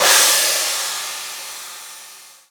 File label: China, EMU Orbit-3 drum machine; 300 Drum Machines